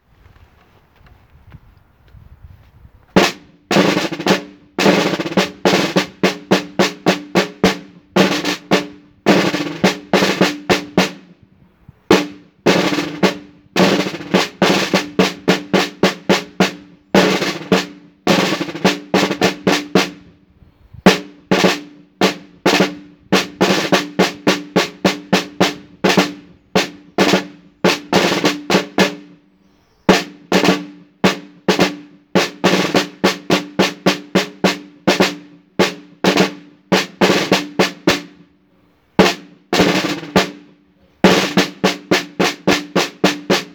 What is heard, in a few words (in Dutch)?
live trommelmars TROMMEL